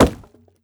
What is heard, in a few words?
wood; Rust